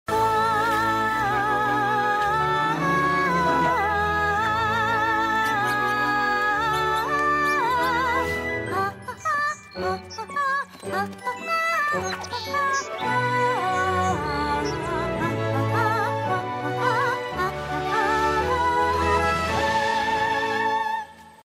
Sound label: singing